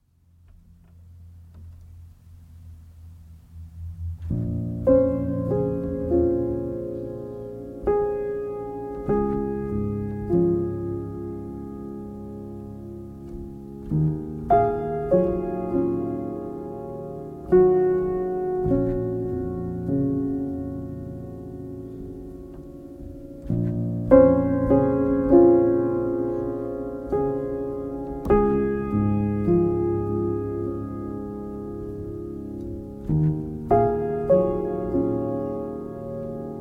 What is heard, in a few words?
solo piano